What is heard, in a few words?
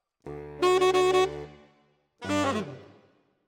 Tenor, RNR for piano